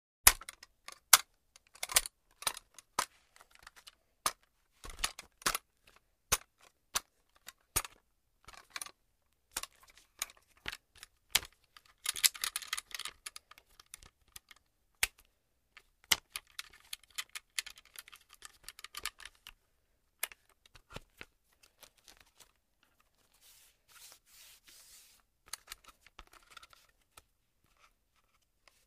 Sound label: M1 Carbine Rifle Move, foley gun movement